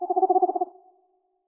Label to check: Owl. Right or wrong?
Right